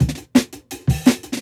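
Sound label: Jungle Breaks, 170 bpm; Original Jungle Breaks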